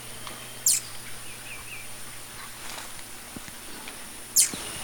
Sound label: violet bellied hummingbird